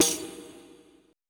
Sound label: E TAMB, ETH PERC